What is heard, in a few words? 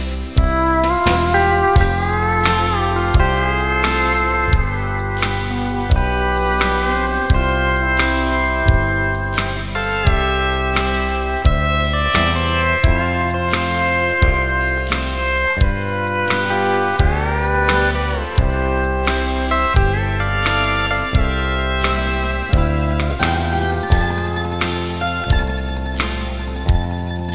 solo, steel gitar; Eksempelet under viser lydprøve på solo